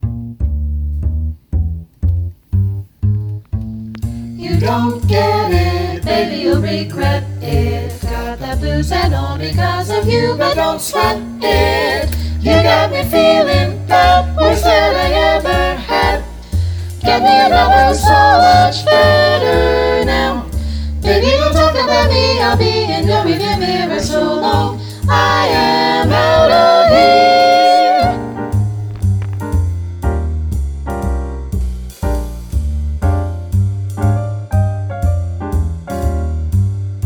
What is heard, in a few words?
Bb Vocal Jazz Etude all parts; jazz all state